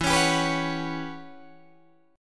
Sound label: E Minor 6th Add 9th, Guitar, DADGAD